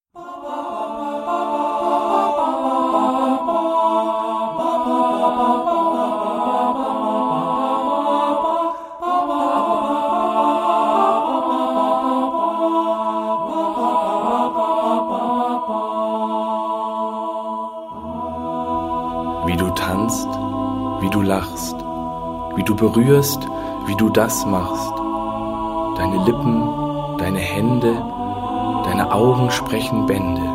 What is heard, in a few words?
acapella